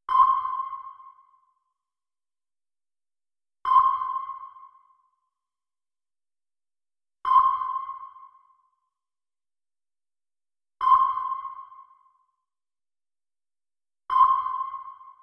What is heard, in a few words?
sonar